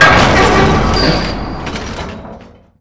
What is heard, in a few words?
vagon; Mafie 1